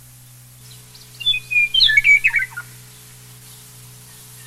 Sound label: meadowlark W